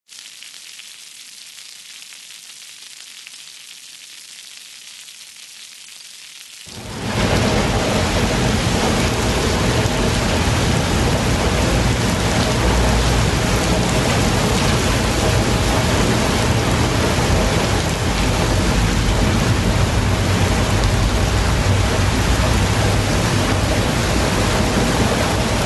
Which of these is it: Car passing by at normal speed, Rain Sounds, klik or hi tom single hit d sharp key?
Rain Sounds